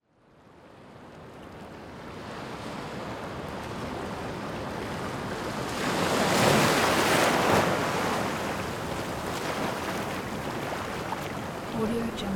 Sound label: water ocean surf